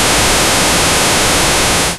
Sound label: user interface